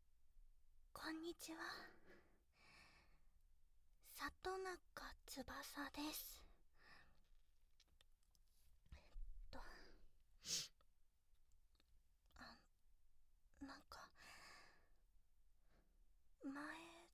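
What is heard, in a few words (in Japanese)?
実演オナニー バイノーラル; 18歳・処女; 大学入学記念にオナニー収録しちゃいました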